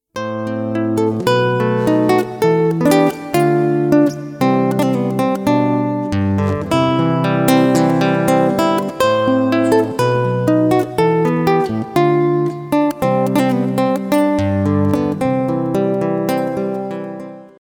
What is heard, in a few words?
Wedding Triumphant